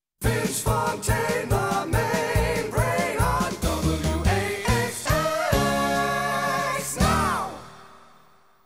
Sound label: Radio Jingle; Radio Waxx